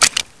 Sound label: m4a1 silencer on, weapons